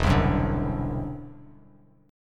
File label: E 7th Suspended 2nd Sharp 5th, E, down, Bass Standard; E7sus2#5; strummed